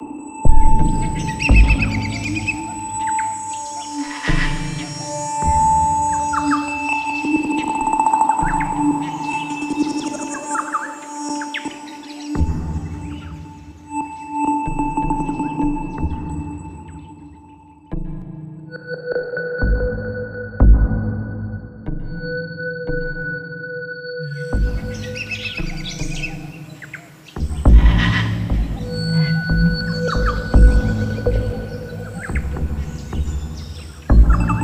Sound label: Desert Light Loop